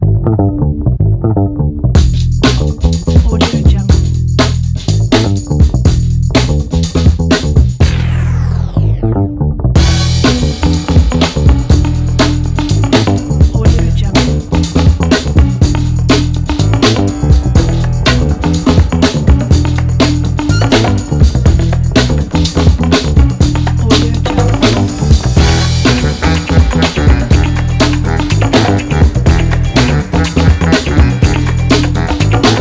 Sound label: action movie funk